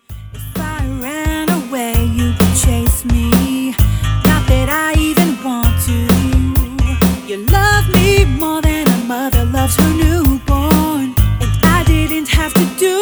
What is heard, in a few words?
Gospel Singer